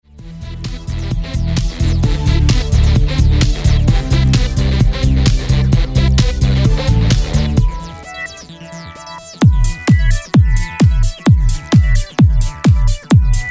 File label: dance music